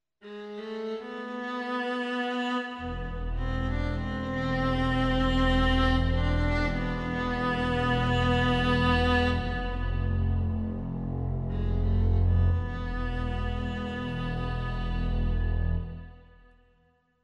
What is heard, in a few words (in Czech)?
zadumcivy 3 solo